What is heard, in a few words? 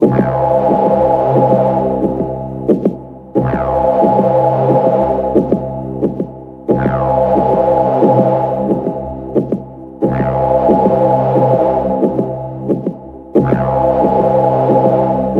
Jingle Map